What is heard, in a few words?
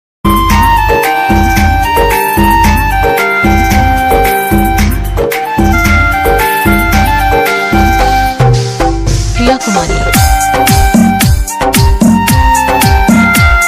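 DJ remix love ringtone romantic mobile ringtone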